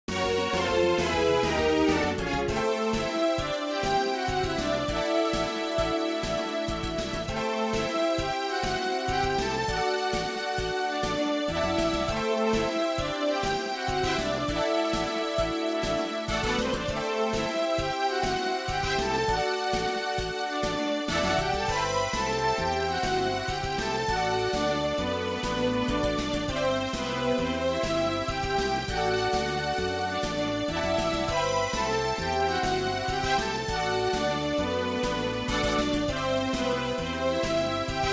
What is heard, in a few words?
MIDI Battle